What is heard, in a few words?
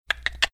tongue clicking